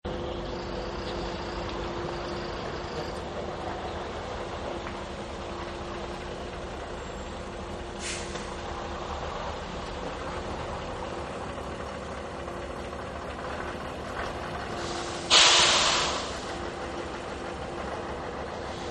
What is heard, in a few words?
Construction Yard; Backgrounds